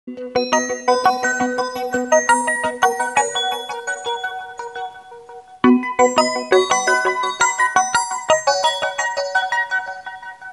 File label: oppo glass ringtone; nhac chuong